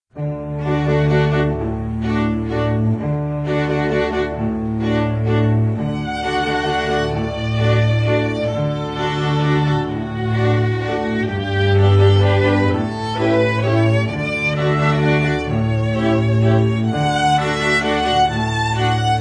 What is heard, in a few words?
Waltz